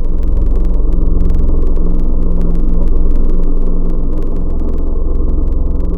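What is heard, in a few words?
Vocal 80's, wavetable; Synthetic's Serum Collection; Echo Sound Works Core Tables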